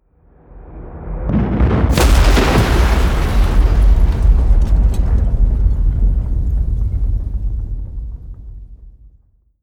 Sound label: detonation